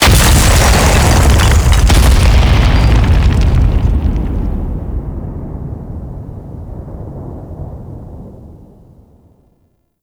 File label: Pillar Crash Explosion; Gameplay; Mission Four; Warframe; The New War